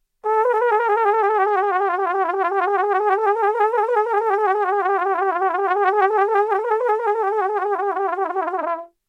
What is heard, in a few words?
trill gliss